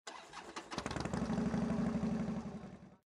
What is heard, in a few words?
harley ignition